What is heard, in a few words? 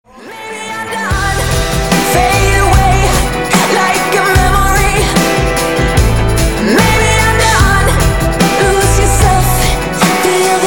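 rock cuts for phone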